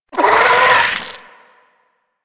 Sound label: Amalgam Moa Satyr Vocal Attack; Warframe